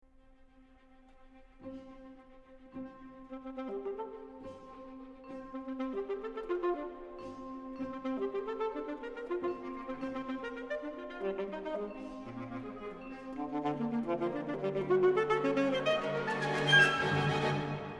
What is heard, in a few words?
Saxophone Concerto; 3rd movt